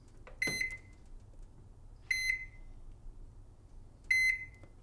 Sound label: Microwave Buttons